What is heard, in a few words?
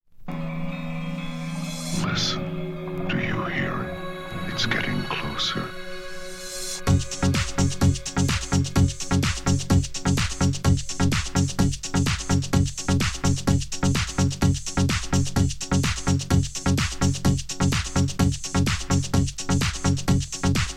Tree Frog Trance Mix